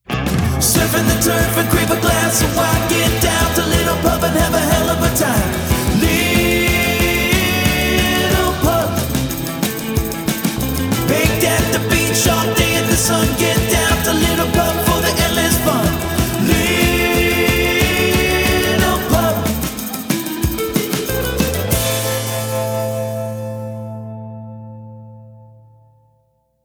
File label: jingle, surfin; Little Pub